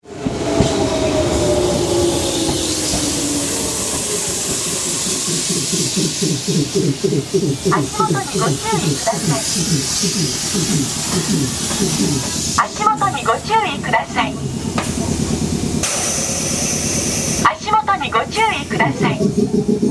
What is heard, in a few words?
station; 瀬戸市役所前駅足元注意放送; 瀬戸電